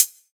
rich top end high hat sample a sharp key

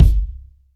fat steel kick drum g key

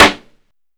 noisy snare sample g key; snare drum samples

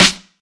juicy acoustic snare g key

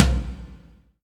large room tom sound a key; tom drum

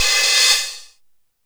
muted open hat sample C key; Bitdepth 24

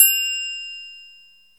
ride sound clip f key

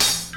modern clean open hi hat A# key

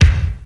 classic kick sample c sharp key, kick drum; kick drum samples

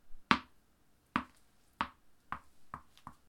tennis ball dropping on a wooden floor

